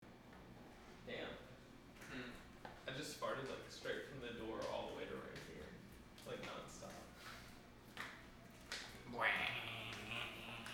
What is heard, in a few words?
Who farted; Tompound